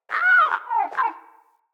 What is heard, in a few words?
Freed Beaver Call; Encounters; Animal Rescue